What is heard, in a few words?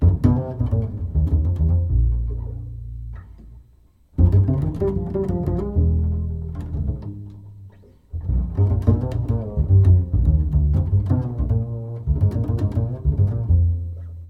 intro blues